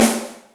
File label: Amb, Yamaha DTXpress Acoustic Snare